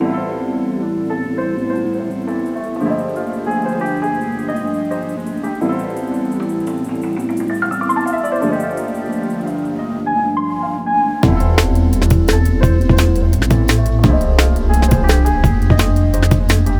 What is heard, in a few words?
jazz